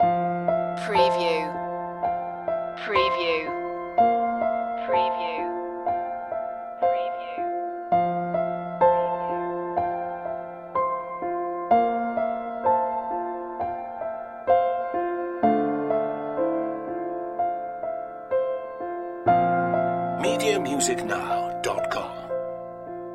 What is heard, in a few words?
soft surrender loop